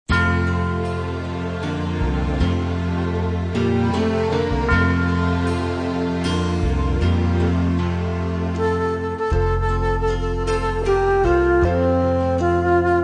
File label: Instrumental Hymns